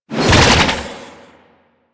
Archwing Prime Sword Short Whoosh; Warframe; Weapons

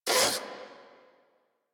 Combat Vocal Pain; Enemies; Warframe; Catbrow

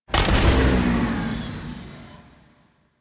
UI Mod Dectivate Super Weapon; Player Ship; Warframe; Railjack

